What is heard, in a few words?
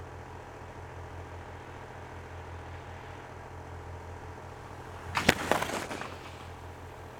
landing; EAU CLAIRE HTS; THOMAS NAUNAS